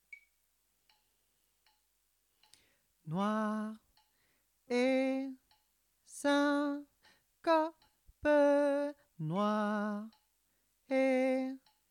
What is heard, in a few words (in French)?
canon jazz voix 4; canon jazz voix1